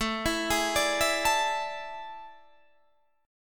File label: A Major 7th Suspended 4th, Guitar, Standard; AM7sus4; arpeggiated; capo=2